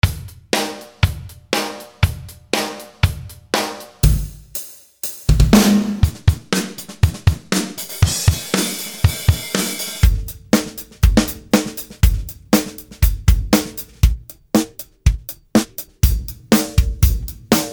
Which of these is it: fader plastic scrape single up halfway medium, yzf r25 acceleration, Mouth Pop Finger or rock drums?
rock drums